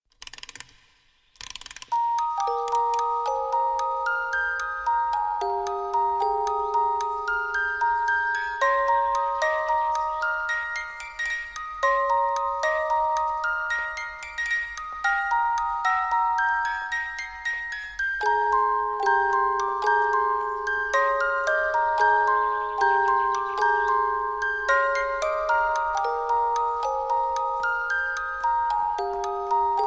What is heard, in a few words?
musicbox; ponyTown